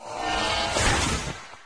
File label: Teleportation